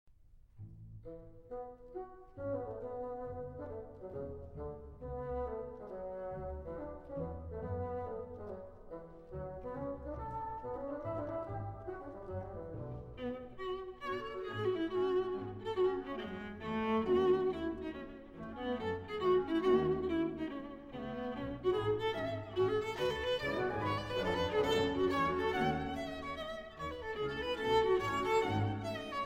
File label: Viola Concerto Allegro moderato; 1962 Version